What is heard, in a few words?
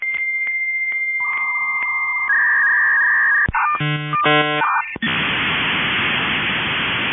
modem tone